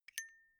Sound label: zippo flicked open ringing